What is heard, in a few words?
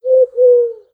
idle coo, pigeon; critters